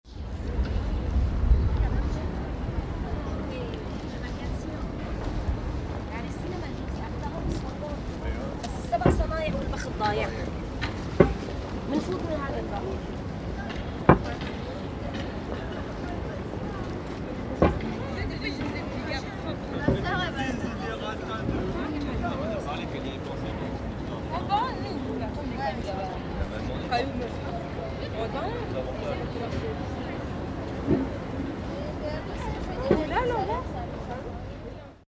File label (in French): sol urbain plocs